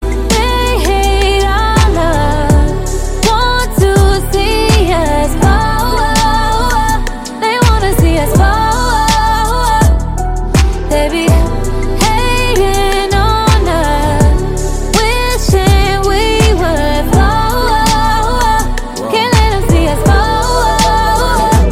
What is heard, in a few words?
mobile ringtone, english